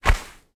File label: sand footstep; metrocraft